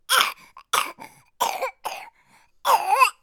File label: Little Baby Bad Cough